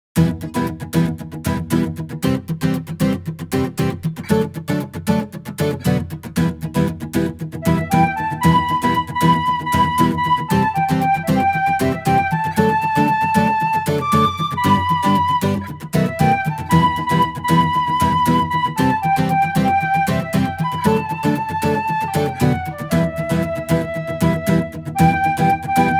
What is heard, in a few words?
INSTR